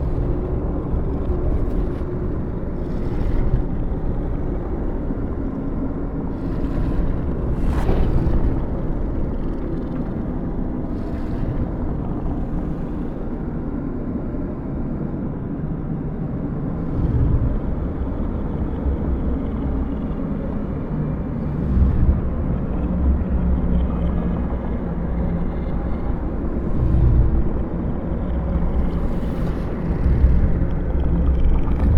amb Boss Room; ambi

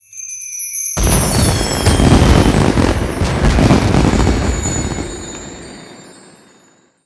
Firework Wedding Boom

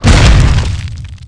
bodyfall knee

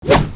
light swing

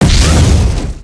fire in attack

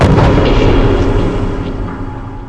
buff ice

